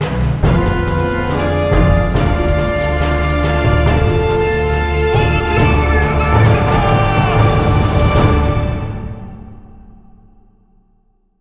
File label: Menu Win; Agatha; chivalry medieval warfare 2012 pc